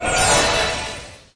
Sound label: random symbol transform; fairyred